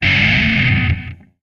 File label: Music E Guitar Disto